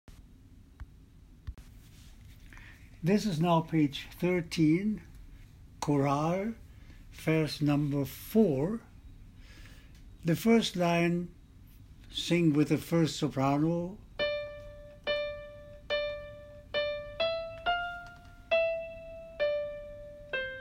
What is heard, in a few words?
BWV 117, only Sop.II